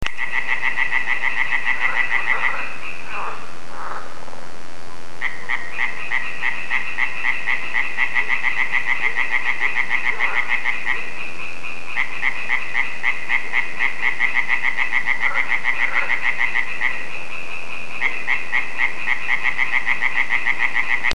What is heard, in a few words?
hyla